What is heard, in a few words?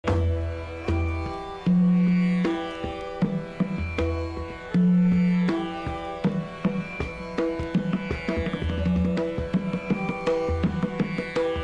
instrument pakhawaj